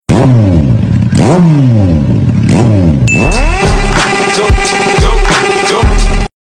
motor

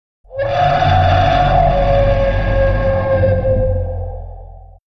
wolf howl horrible creature

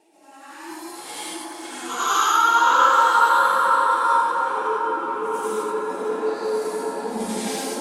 aaaaaauggghhh

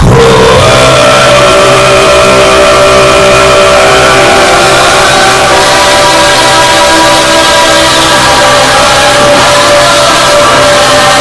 loud mongol music

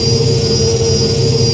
y wing engine; lego star wars

